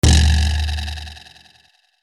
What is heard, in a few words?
comical down; 【効果音】コミカルな落ち込みショック音; コミカルな落ち込むショッキングな効果音素材です。